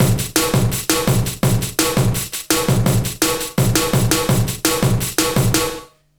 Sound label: BREAK, Explosive Jungle; USB Soundscan vol.05; AKAI; 90s sample CD; 166 BREAK